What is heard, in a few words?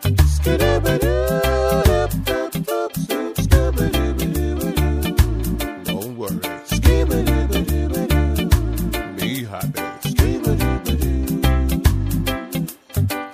be Happy Ditty, steel drum band